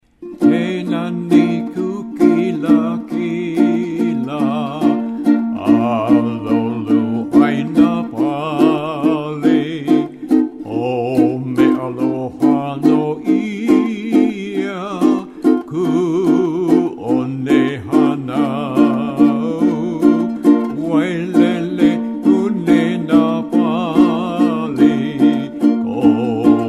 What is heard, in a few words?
Waltz